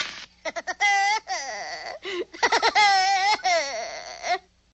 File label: hehehehe; picapau